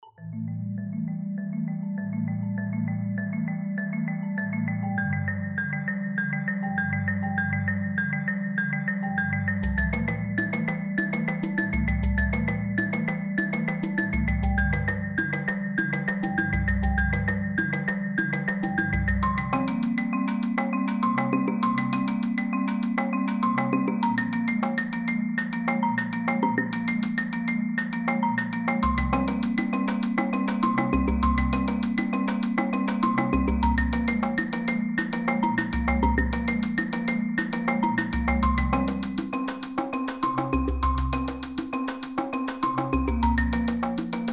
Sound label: Marimba